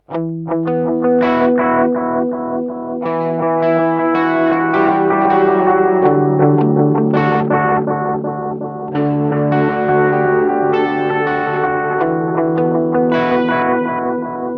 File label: Overdrive Delay